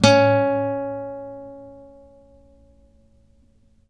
acoustic C#3 L, guitar; East West; Steve Stevens Guitar Samples Collection; ACOUSTIC PCK

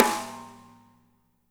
SNARES; Spectrasonics - Burning Grooves CD2

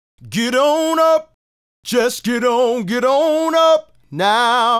Male Solo, Hip-Hop and RnB Vocals

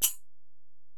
Ac Perc, Drum Machines Alesis DM-PRO

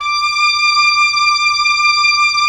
OB, Oboe; Roland - LA Composer vol. 4

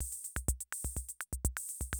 CR78 125, drum loops

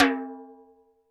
TD-20 Tom A, Roland TD-20 Toms

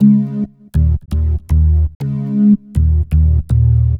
NRG Bass, Trance

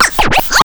scratch; Zero-G; Freak Beats

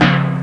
INS TIMBAL

